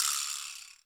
jawbone; Greytsounds; Scott Peers Sound Engineering

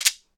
SHEKE, Afro Shakers, Shekeres

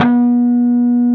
PRS PWR C4D, Guitars; PRS PWR CUTS

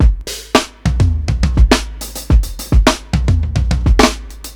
105 TOM BEAT, DRUMS LOOPS; BASEMENT DRUMS VOL 2

